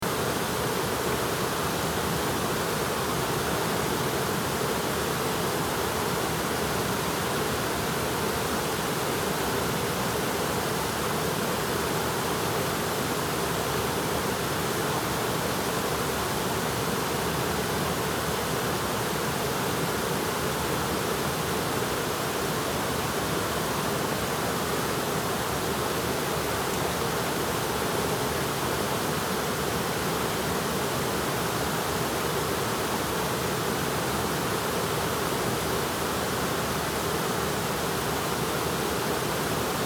shum vodopada

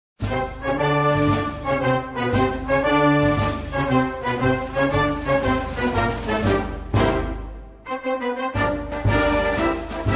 marshevaya muz

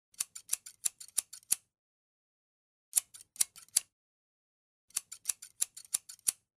zvuk nojnic

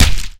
udar

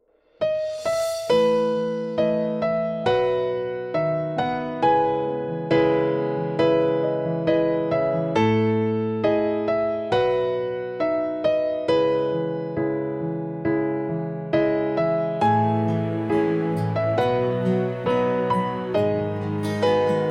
zastavka k liricheskoi scene

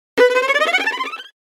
skripka effekt

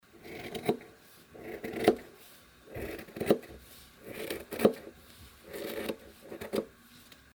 tkan rej nojnicami

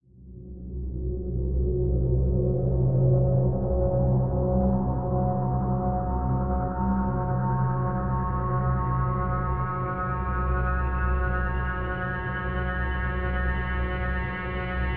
atmosfernyi zvuk opasnosti

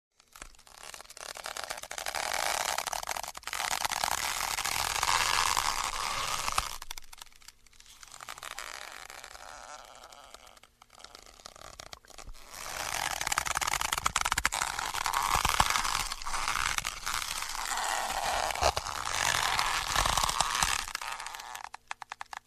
zvuk sjatyh kulakov v kojanyh perchatkah

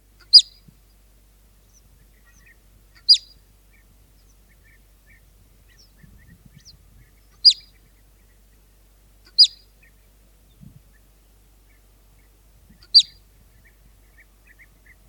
surok, zvuk surka

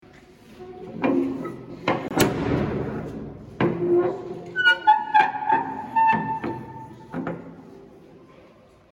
zasov dveri; Эти звуки были записаны в замке, который использовался как тюрьма или темница

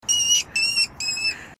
norka

